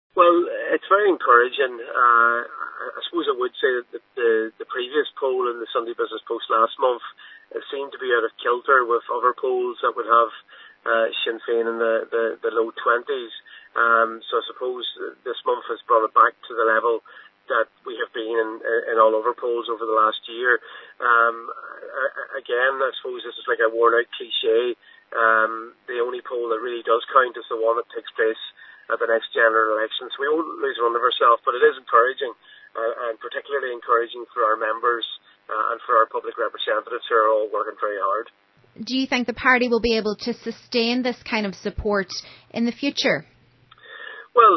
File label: pad